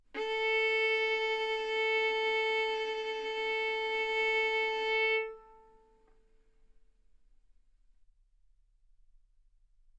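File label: Violin arco mf A string A4 B4 stereo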